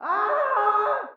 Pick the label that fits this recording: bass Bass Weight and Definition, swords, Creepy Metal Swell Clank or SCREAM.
SCREAM